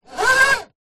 electronic typewriter carriage manually move slide; office; brother ax 450